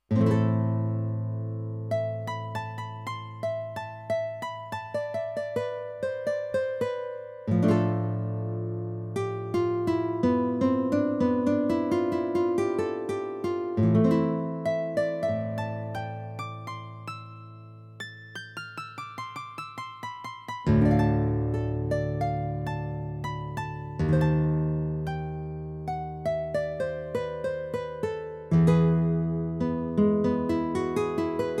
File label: Guitar